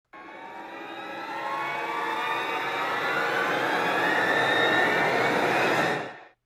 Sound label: horror before the jump scare music